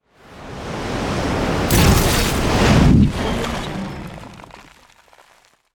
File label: jet plane drop bomb